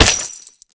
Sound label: money pile break